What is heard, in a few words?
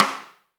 Rim